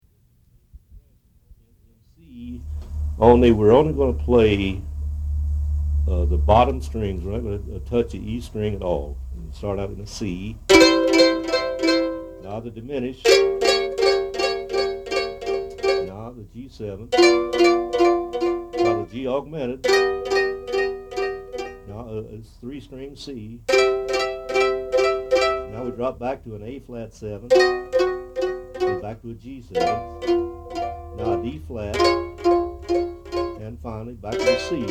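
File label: Chromatic C Progression, mandolin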